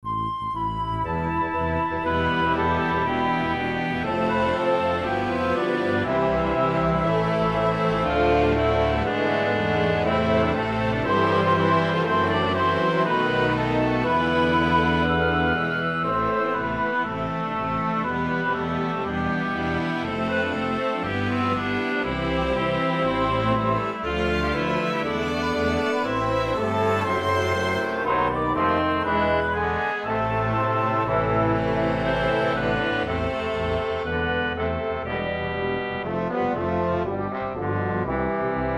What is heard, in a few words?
pop minor